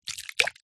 cartoon slime gloop slop movement

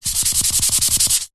office chalkboard eraser duster rub

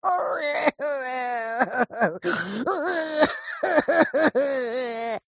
cartoon male crying

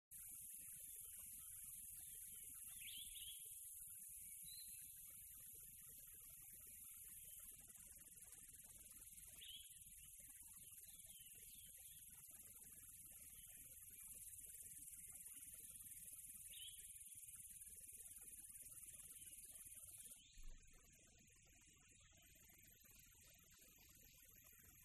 NATURE Quiet Tropical Night With Cricket; ATMO; smartsound

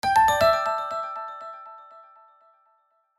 multimedia alert tone piano glock

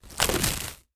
cling film rip; household

